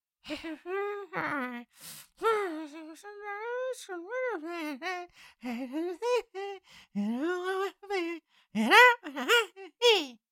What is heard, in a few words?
Cartoon little monster voice grunt growl